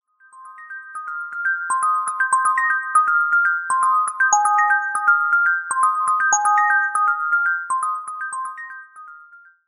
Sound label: bells